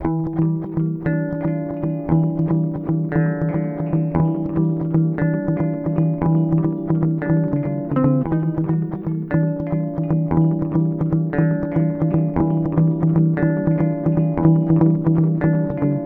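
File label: Radical Delay Bend, guitar pedal; Alexander Pedals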